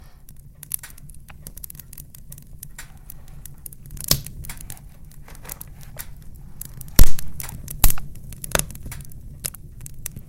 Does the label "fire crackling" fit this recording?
Yes